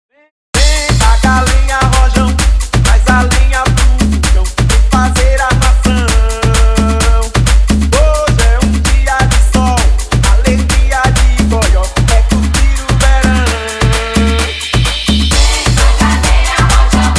mashup 130 bpm; Reggaeton dj remixes

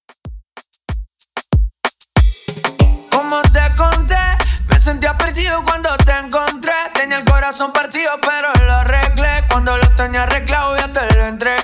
Intro Outro Bpm 94

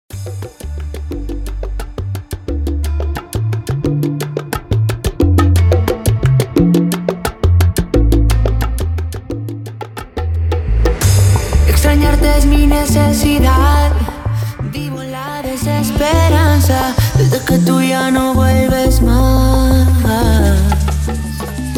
Intro Outro 88 BPM

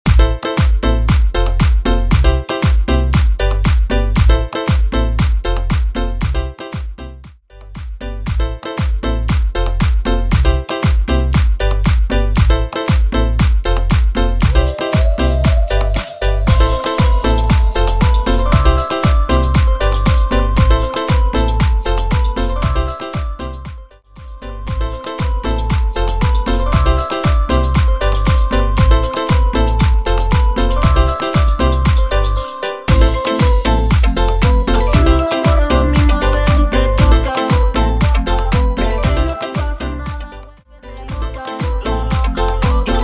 Mambo Project 117 BPM, Merengue Bootleg Remix

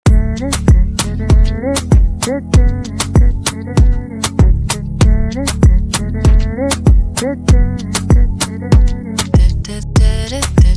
Intro Outro 97 BPM

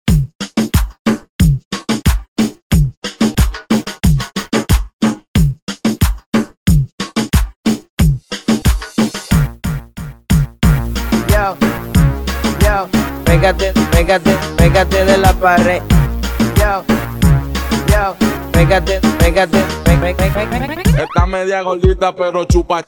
House Remix bpm 91

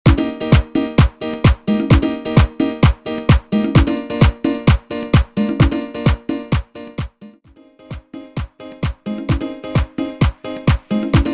transition merengue to bachata 130 BPM

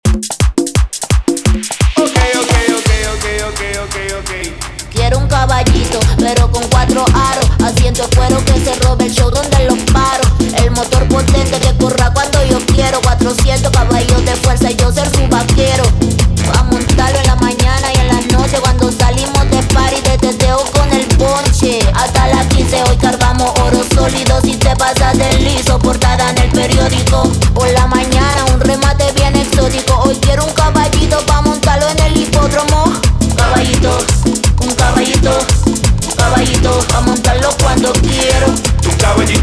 171bpm

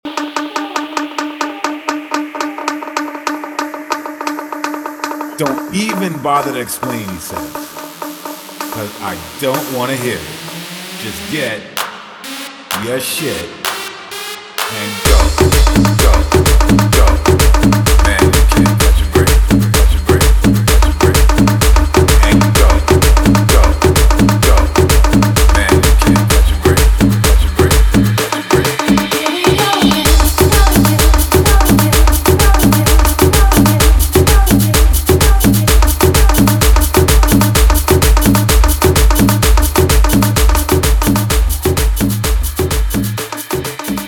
guaracha remix 128 bpm

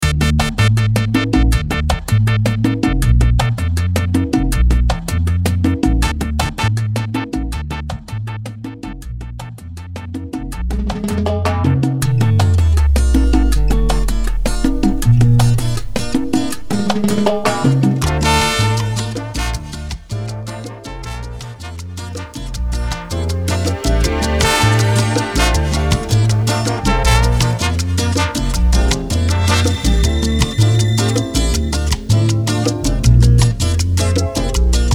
intro outro break 80 BPM

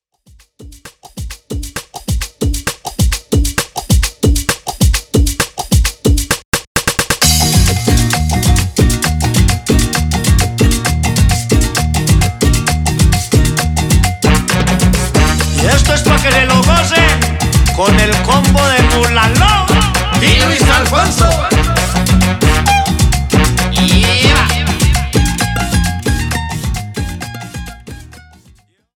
Remix Intro 132 Bpm

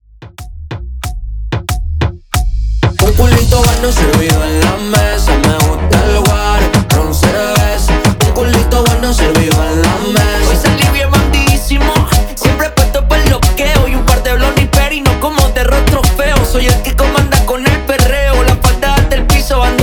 Perrumba Intro Verso 92 Bpm; Essntial Tools

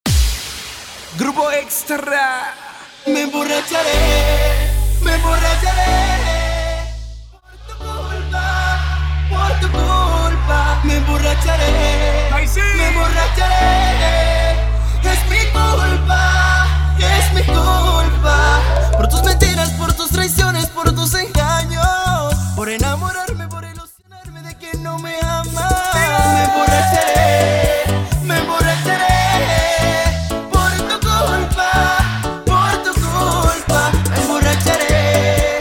acapella starter 124 BPM

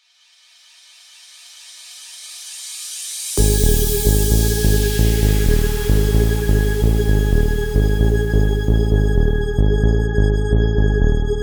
intro outro 130 bpm

